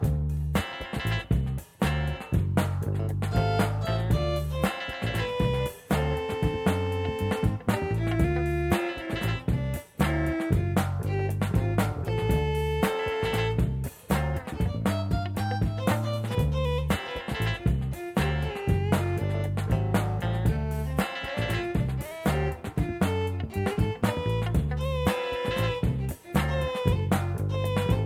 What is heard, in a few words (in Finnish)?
viulu, guitar synth